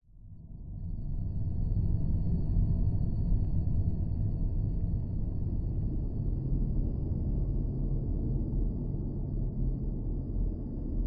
science fiction wind planet desolate landscape desert dust storm